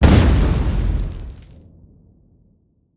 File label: small explosion; factorio 2.0.55